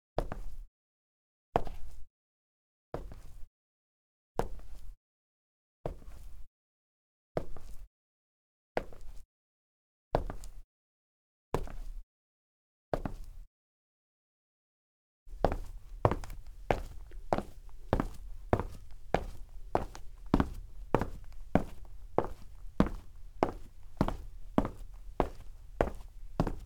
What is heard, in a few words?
Wood Hard Sneakers, Foley Footsteps; Sound Ideas